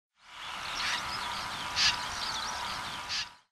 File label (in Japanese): tashigi, hyogo